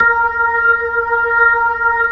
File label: B-3, Jazz